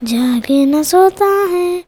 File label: CHILD, Indian Traditions